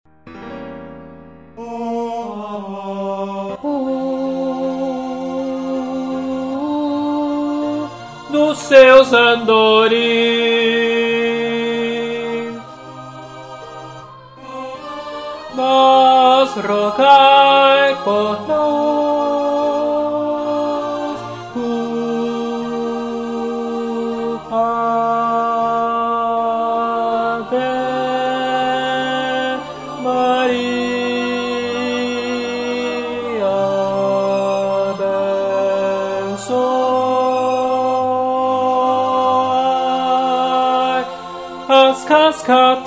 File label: ave maria tenor